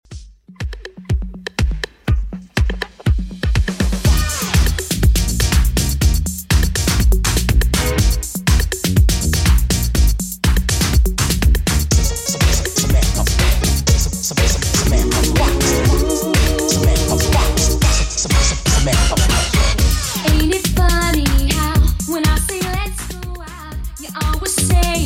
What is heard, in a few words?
80s Redrum 122bpm